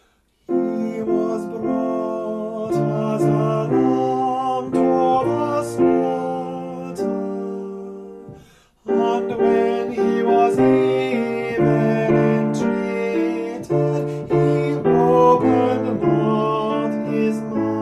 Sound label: tenor